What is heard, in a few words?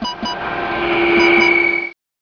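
turret idle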